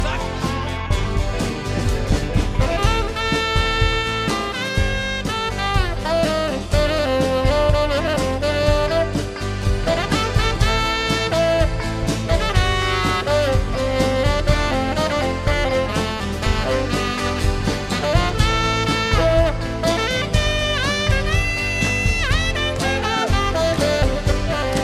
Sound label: Solo